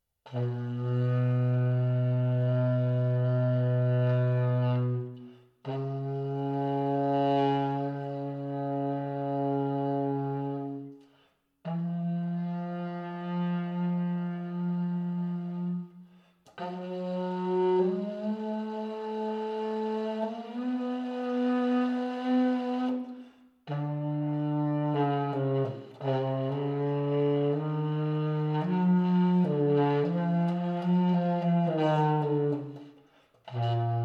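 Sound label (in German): bar, Alla tromba, Einzelne Töne gehalten; legato mit verschiedenen Tönen. Bariton; saxophon; spieltechniken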